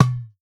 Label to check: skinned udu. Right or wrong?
Right